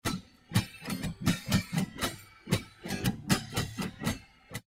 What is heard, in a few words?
AG Noise